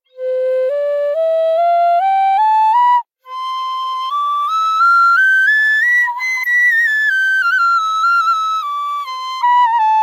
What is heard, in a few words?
flute C